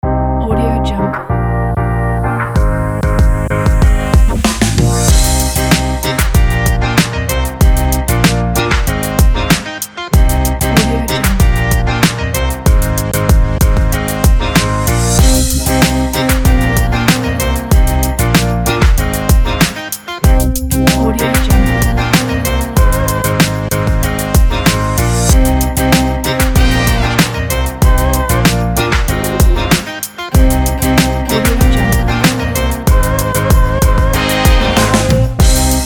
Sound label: the hip hop